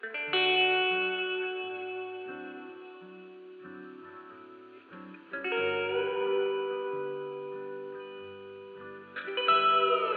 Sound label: radio carib; teardown; ps5 windows xbox series xs